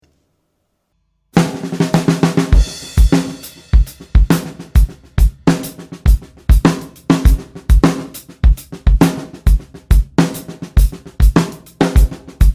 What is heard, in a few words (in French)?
rythm, Drums; MusicRadarFrance; A vos baguettes